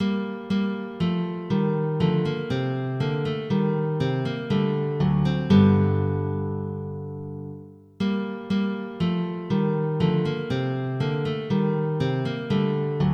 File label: Ritornello, MIDI